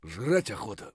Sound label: tired; Escape from Tarkov